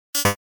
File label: Futuristic error